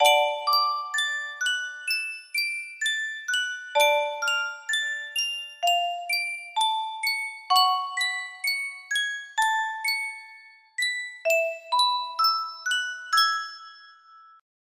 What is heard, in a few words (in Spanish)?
Yunsheng caja de musica